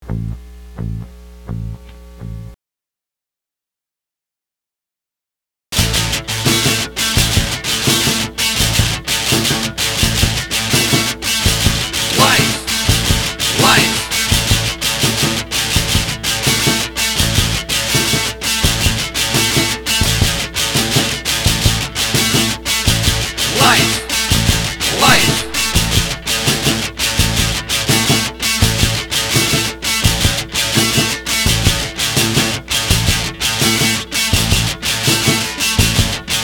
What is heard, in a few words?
novocal